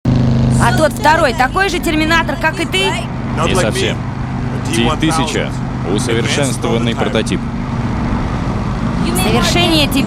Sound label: liquid metal; terminator